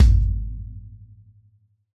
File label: Battle Inner Stinger; Battle Inner Stinger; Battle Outer Stinger